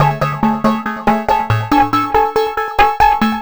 TECHNO SYNTH 140; Keys

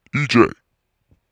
techno vocals 140

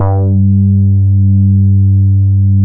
wow bass G3; SE-1 BASSES

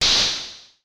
FX SHOT TEKNO 140; Techno2; Singleshots

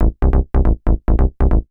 TECHNO BASS 140 A

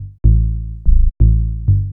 HOUSE BASS 125 A

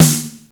SD DEEP, snare drum; SNAREDRUMS

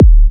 Minimal Kick, Drums, Kicks

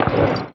One Shot, Hits

